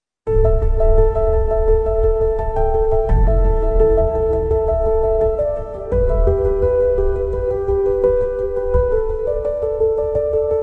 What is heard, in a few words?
ambient background